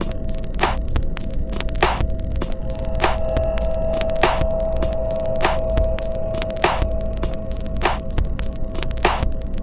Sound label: Warped Element